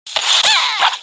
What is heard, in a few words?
death sound; fun run